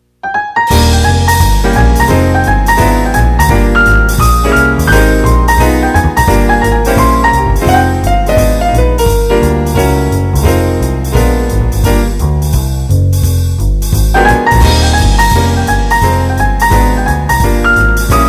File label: music fast swing